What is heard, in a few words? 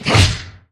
eknight attack; Akeron